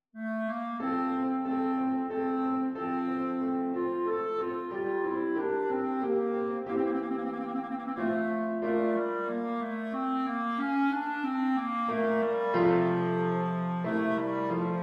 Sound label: Flute and Piano; Andantino; Little Serenade; Haydn